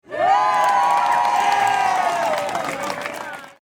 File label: crowd cheers applause